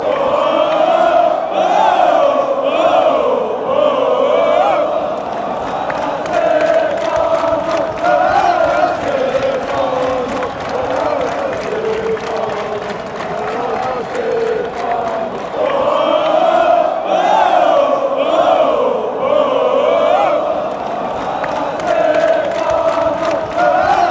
allez allez allez stephanois oh oh oh, football chants; st etienne